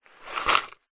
household jewelry in wooden box tip slide lid closed; zapsplat